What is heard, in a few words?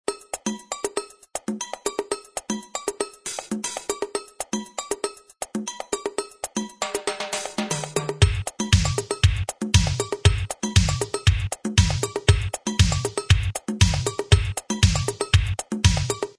akai xr10